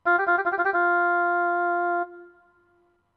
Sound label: oboe short